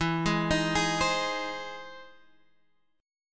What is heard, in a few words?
E Augmented Major 7th E down Guitar Half Step Down